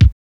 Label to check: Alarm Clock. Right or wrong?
Wrong